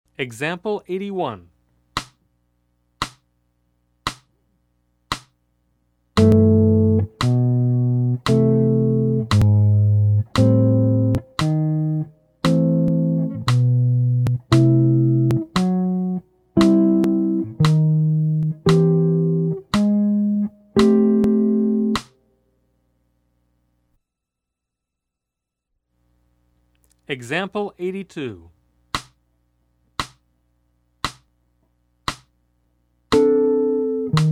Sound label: Jazz